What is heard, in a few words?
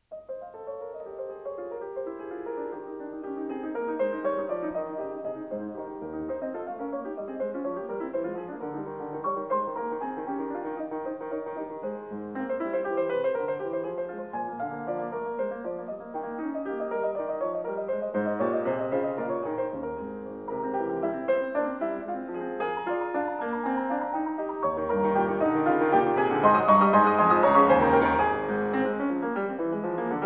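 Piano Sonata No. 12 in A flat major; Wilhelm Kempff; Beethoven; Piano Sonatas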